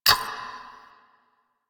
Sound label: Sticky Bomb Detonate; Grineer; Veilbreaker; Vor